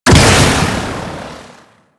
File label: Bow First Explosion, Weapons; Warframe; Corpus